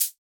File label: acoustic shaker clean